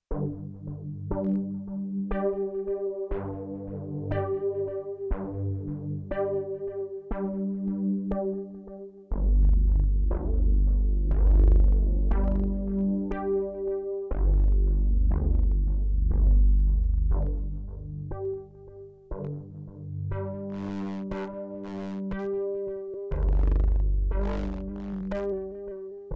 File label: Delay Synth Analog Chorus Hardware; BIAS Pedal